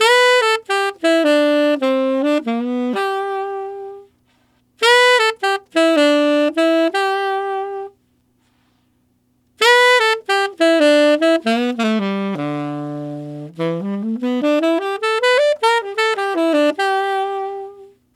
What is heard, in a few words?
Tenor Swing in G Maj, Tenor Sax Loops, Jazz; Sonic Foundry (Sony Creative Software); Jazz Solos and Sections